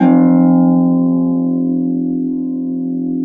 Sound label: F0 HARP; USB Soundscan vol.62; AKAI